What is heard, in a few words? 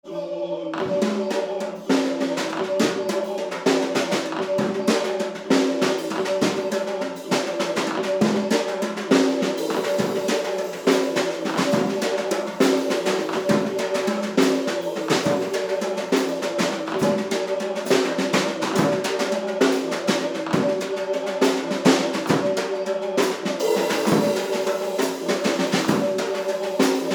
Drums on Notes on Cuts